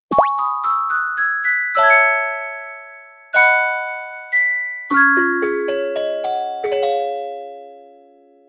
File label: Save Jingle; Rune Factory 4